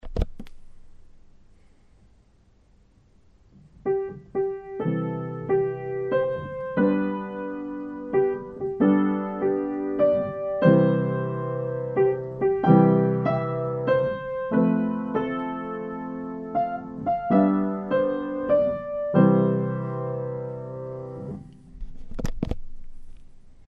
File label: chords melody, piano